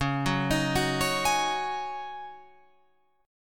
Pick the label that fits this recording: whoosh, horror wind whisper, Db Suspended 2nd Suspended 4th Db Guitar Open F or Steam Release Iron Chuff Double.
Db Suspended 2nd Suspended 4th Db Guitar Open F